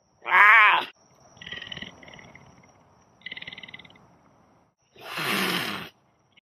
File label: Cougar; شیر کوهی